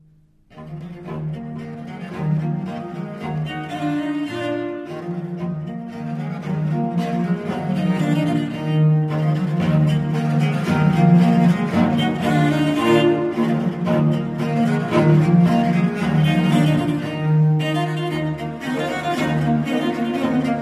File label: tambourin et rondeau